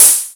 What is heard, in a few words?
crispy hat sample c key; tuned drums